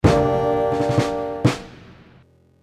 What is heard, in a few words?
self frag; Soldier Of Fortune II Double Helix; XBOX